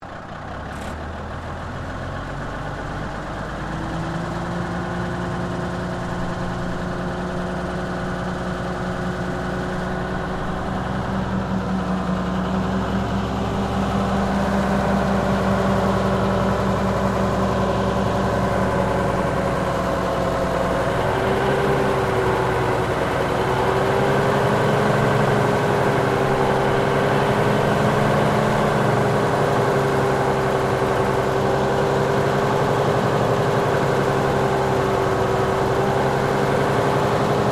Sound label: Truck Military M816 Wrecker Reverse Slow, Tank Transport vehicle; Trucks; Vehicles